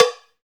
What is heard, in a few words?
CowBells